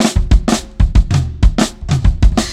Brown Kit 1-Bar Fill, Drum n Bass; KJ Sawka - Drum n Bass & Beyond; Beats and Percussion

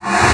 AIR, BASSOON; Xsample VOL-6

